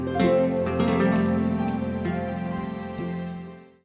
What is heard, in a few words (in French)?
Serait ce une Harpe; Les instruments de musique